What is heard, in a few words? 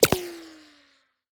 Bullet Wizz, ricochet; tacz-tweak-pack; taczmechanics